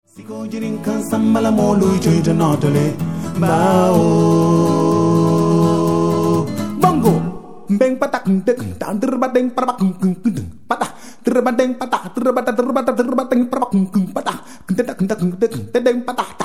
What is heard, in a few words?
bongos